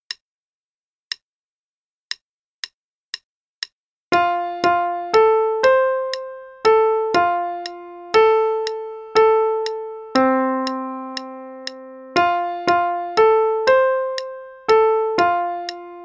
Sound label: Racecar Rally (st part, qn=120); Faber Adult Accerlated; Adult Accel Level 1